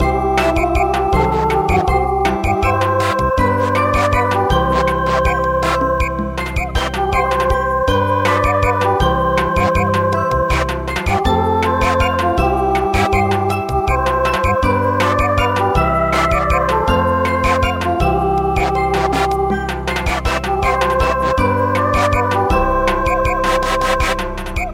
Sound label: Samba